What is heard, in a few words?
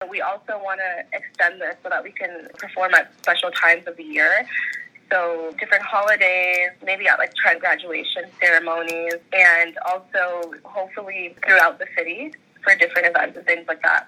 choir